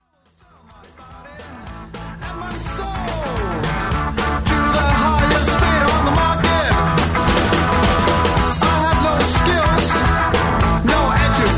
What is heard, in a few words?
Garage rock